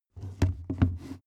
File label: step wood turn